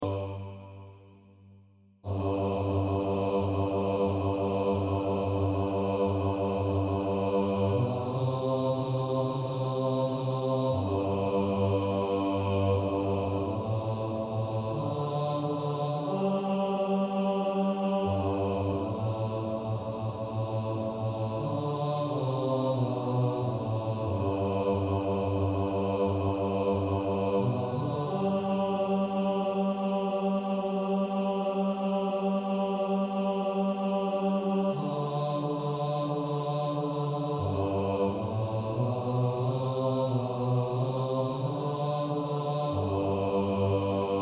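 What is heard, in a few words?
bass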